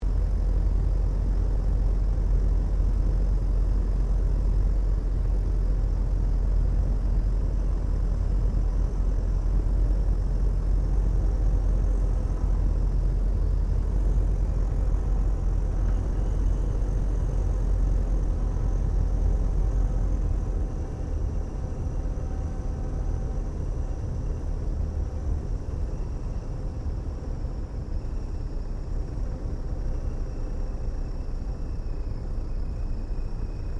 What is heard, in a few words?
1916 Sopwith Pup replica touch n go interior, airplane; Airplanes; Vehicles